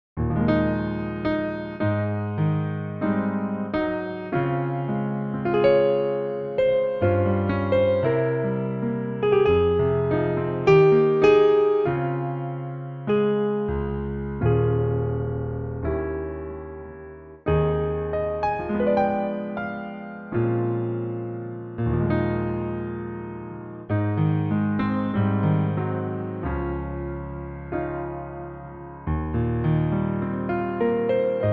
piano

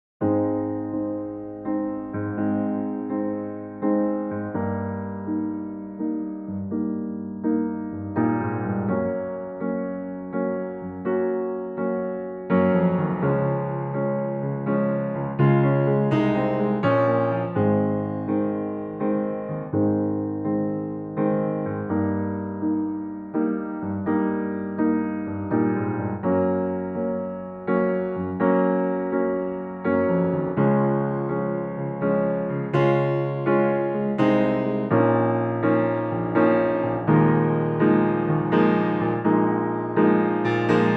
Ab piano

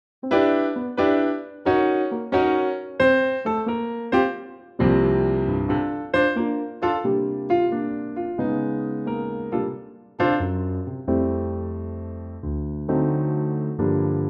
piano

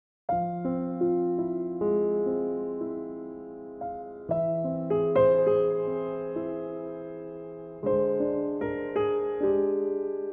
C Piano

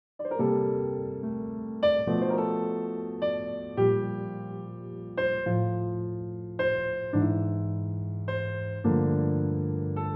F piano

